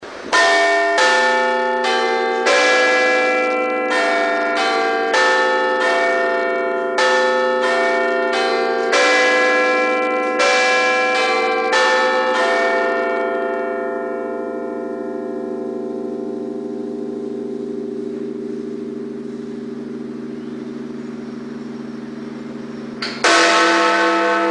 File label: bigben